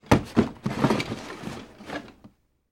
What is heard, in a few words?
Furniture Rummage Through Drawers Short; Household